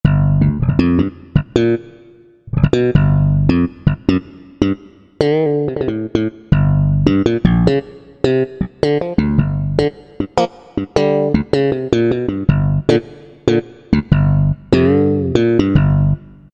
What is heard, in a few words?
New Slap, Korg T3